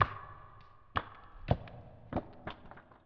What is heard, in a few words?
rocks; The Elder Scrolls III - Morrowind